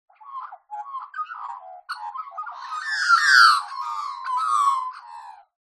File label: animals birds magpies australian x2 call close up